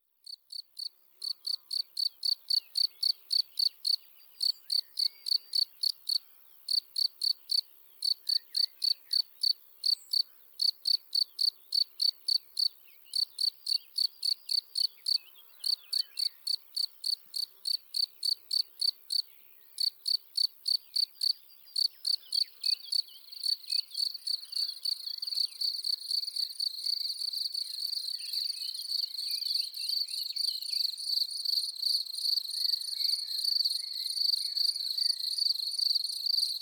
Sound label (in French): les violons du grillon